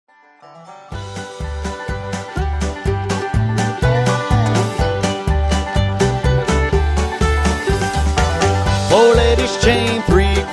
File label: Vocal